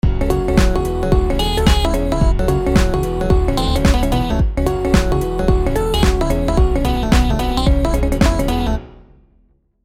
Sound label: thirds